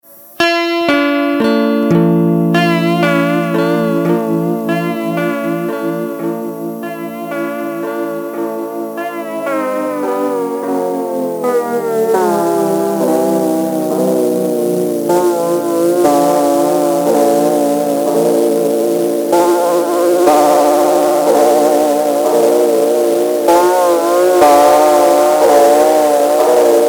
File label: sandwich echo